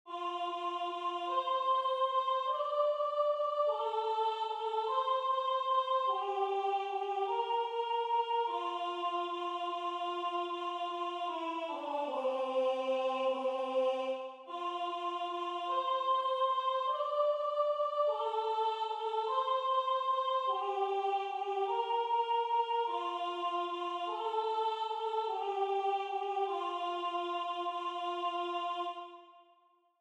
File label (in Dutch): Sopraan; Kind ons geboren